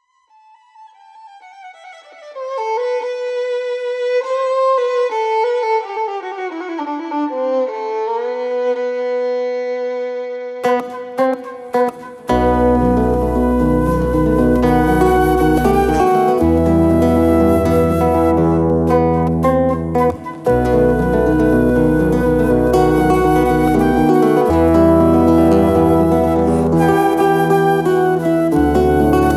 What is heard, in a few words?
Balkan Blues